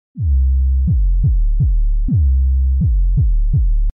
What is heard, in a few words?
WAVE